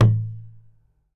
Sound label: Tom Acoustic Crush Hi Phaser